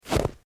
Footsteps Snow Single; Human

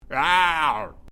Created Creature Small Ouch, Alien Sound Effects

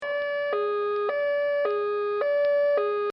Boat Coast Guard Sirens; Electronics

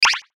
Sweeper High, Distortion, Sweep, Pan; Sweepers; Multimedia; Production Element, Imaging Element, Accent, Transition; Blastwave

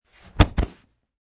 Grapple Noise

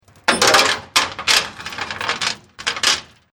Chain Metal Clanging

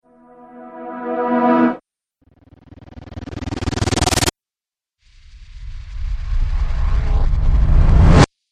Cinematic Riser Pack; Rise And Dive Sound Effects

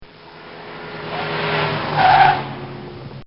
Volvo Braking Hard; Cars; Vehicles; Bobby Cole

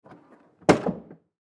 Diaper Changing Station Close Push Up; Diaper Changing Station Close Push Up Hard Public Bathroom; Industry; Blastwave

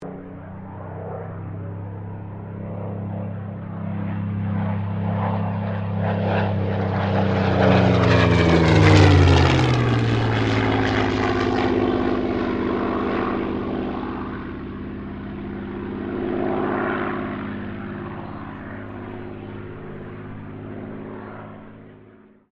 AIRCRAFT SUPERMARINE SPITFIRE MK-XVI PASS

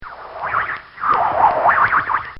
Cartoon Sound Effects Swoosh; Cartoon Sound Design

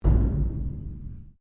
Strike Metal Hollow Tank

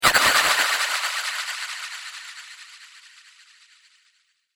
Laser Liquid Fire, sci-fi laser sound effect; Laser Future - Liquid Fire

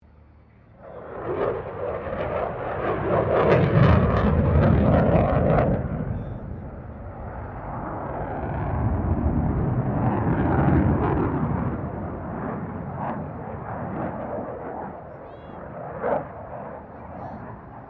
F16 Falcon pass distant, stereo; Airplanes; Vehicles

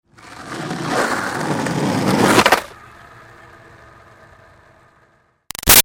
Skateboard Up Curb Hit Crash; Roll Up; Skateboarding; Sound Effects:Sports; Big Room Sound

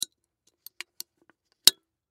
Can Remove Tab Aluminum Empty; Pops; Foley

